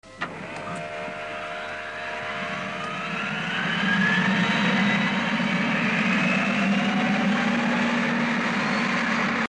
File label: car start up